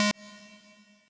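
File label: square lead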